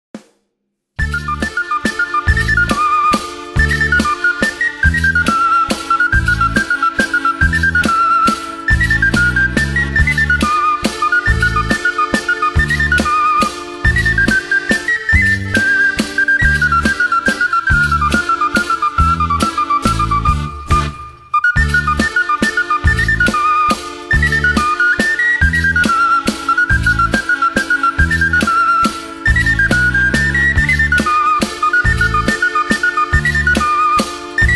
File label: Mazurka